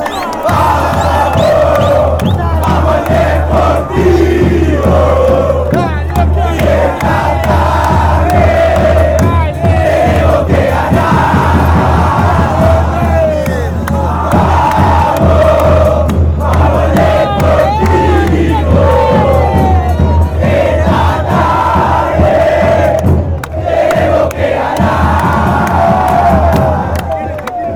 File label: chant, argentina; deportivo merlo